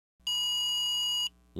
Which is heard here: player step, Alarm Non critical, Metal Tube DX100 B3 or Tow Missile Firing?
Alarm Non critical